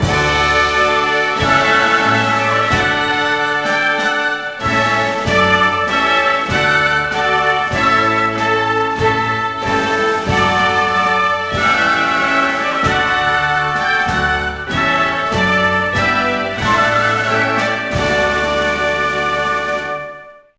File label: CLARK TPT